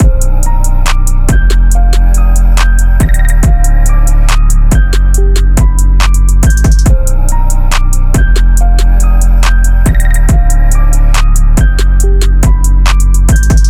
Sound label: Trap